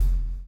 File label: CHAIN; Spectrasonics - Backbeat